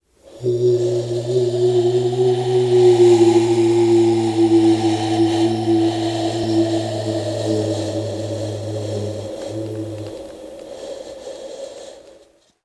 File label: Ghost Cry Supernatural Flying; ghosts & demons